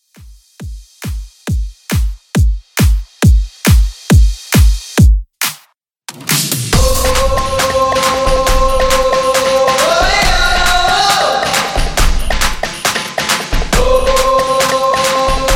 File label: Club ReDrum 137Bpm